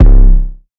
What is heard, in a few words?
808 - C, trap; 808s; Sicko 808; Cymatics; Trap Starter Pack